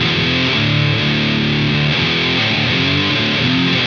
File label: Southern Metal; Sonic Foundry (Sony Creative Software) - Crimson Blue and Fabulous Horncraft 4 RnB